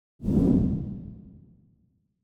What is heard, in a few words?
Dark Magic Movement; SoundsCrate